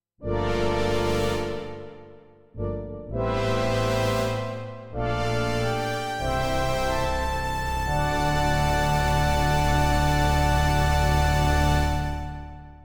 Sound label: Z1 Samples pad majestic